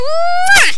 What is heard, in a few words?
kiss